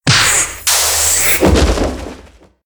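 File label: death, monster; Senillinea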